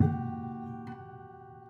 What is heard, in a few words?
Prepared Piano